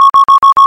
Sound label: Tesla Autopilot Warn